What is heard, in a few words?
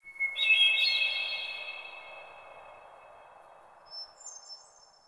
Wetland Forest Hermit Thrush